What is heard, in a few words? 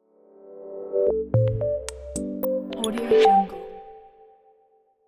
simple soft and modern logo